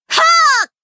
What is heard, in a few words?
haa